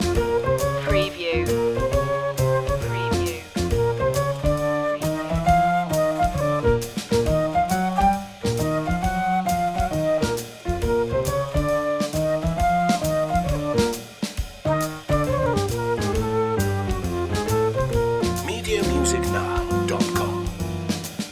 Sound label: apple blues loop